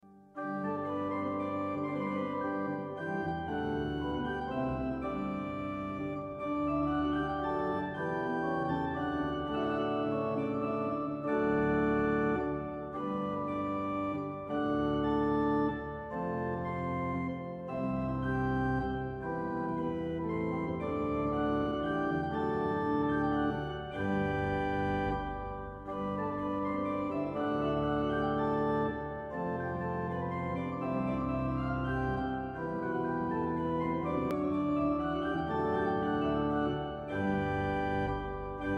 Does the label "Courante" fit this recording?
Yes